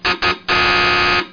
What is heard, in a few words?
BUZZER; effects; Phase 4 - Desktop Video Dreams; E. M. Computergraphic